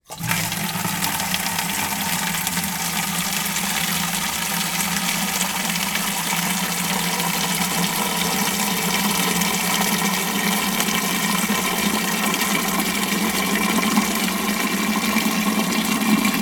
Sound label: household watering can plastic fill from water butt; sound effects one